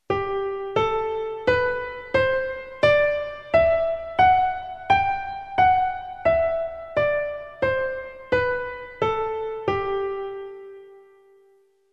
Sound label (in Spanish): escala sol m agudo